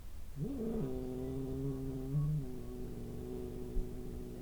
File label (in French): grognement